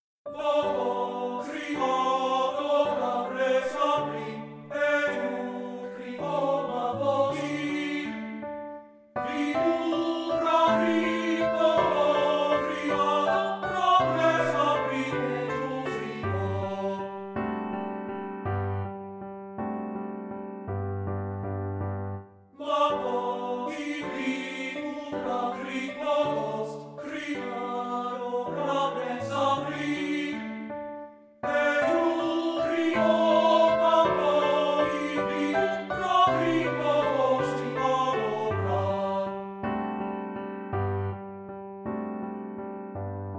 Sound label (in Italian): Lied per voce e pianoforte; Rosetti; Ermunterung zum Lobe Gottes